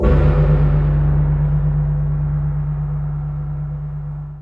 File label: Hell Bell, Weird; Industrial